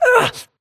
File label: grunt hits; Titanfall 2